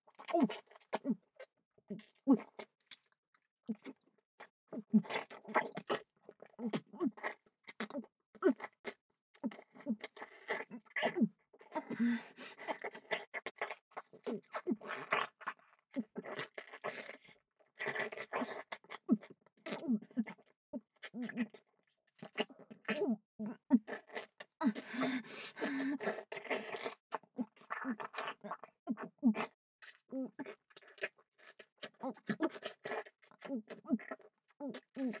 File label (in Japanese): フェラ; アドリブ集